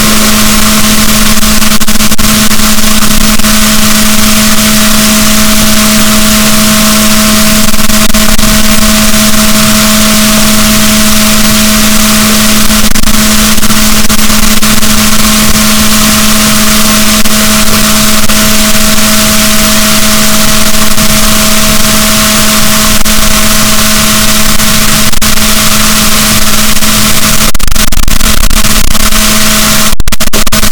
rorquals positif, boat, BalaenopteraPhysalus; Balaenoptera Physalus Chile

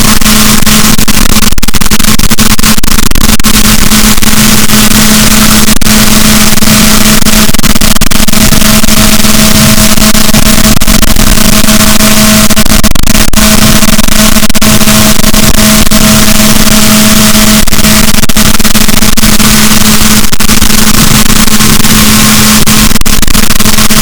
rorquals, Balaenoptera Physalus, boat; Chile; SNR -6dB

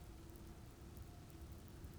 pulses, BALAENOPTERA; MONACO 2022-07

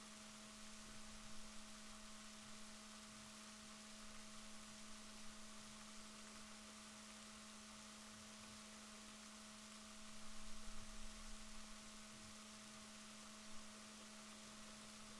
rorquals positif, Balaenoptera Physalus Chile

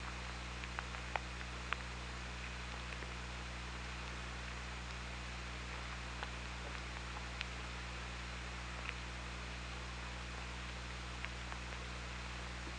OrcaLab

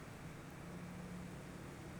pulses, BALAENOPTERA; MONACO 2022-07

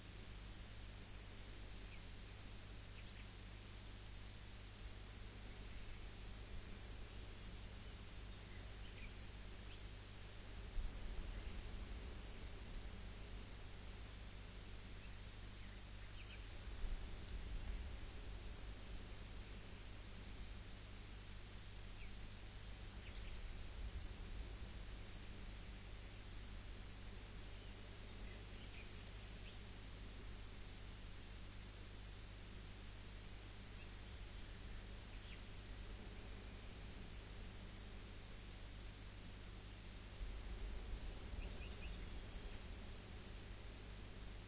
rayol farm; SEAMED

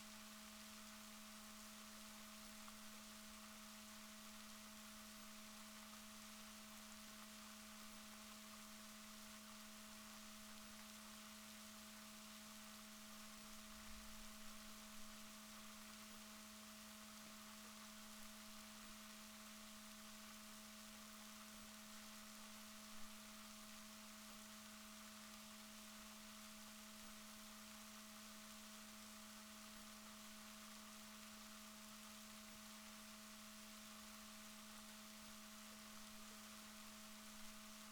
rorquals negatif, Balaenoptera Physalus Chile

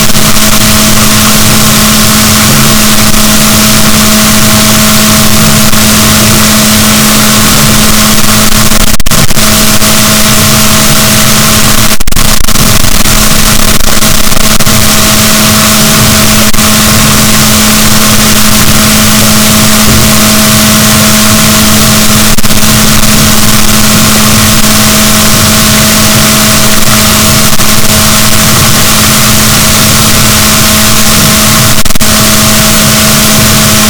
rorquals negatif, boat

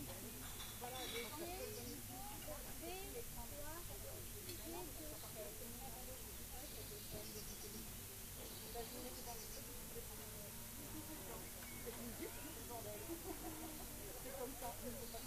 rayol farm; SEAMED

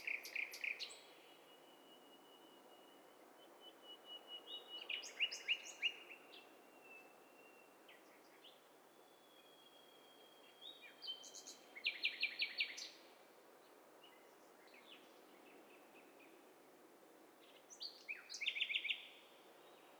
STEREO BIRD COCKTAIL PARTY

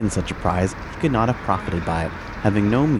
noisy

